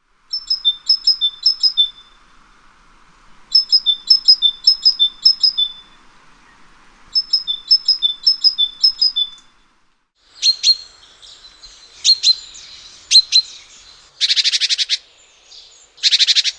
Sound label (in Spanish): carbonero comun